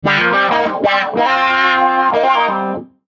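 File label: Musical Element, Drum Snare March; Blastwave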